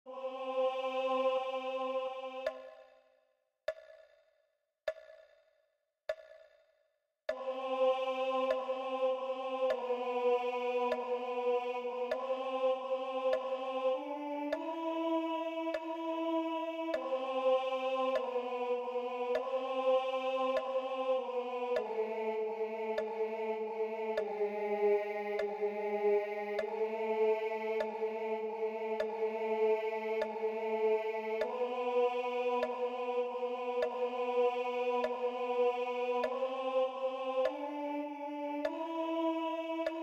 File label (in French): signore delle cime enregistrement ténor